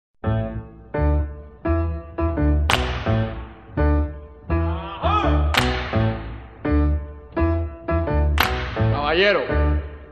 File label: riff